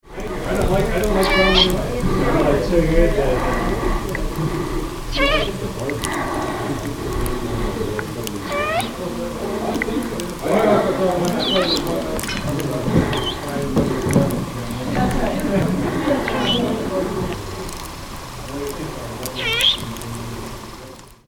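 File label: great horned owl, juvenile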